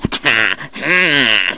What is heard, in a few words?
attack